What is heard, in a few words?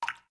Multi Menu, Keytone sounds; KM570 Cookie Gig; LG; Tada